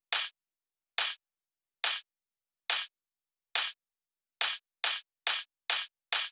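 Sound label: drum Loop 140BPM overhead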